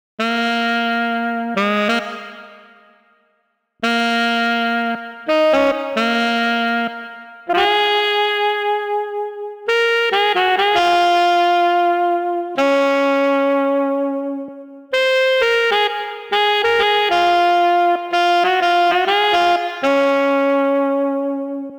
Sax

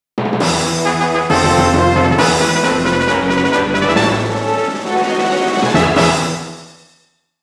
Battle Opening; Monster Farm 2; Continental Opposition

